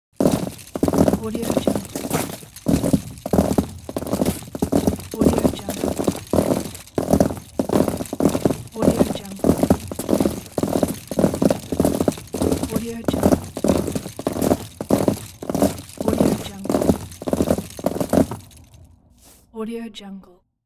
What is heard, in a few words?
police officers urban street footsteps